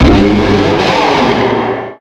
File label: pokemon cry